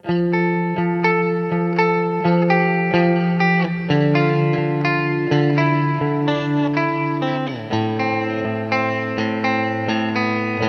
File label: Plate; Boss RV-200